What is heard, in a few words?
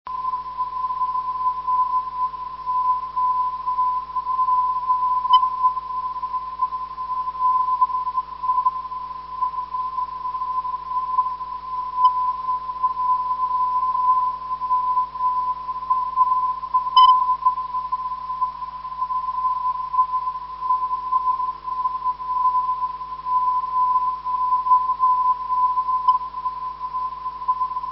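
rom 302khz, non-directional beacon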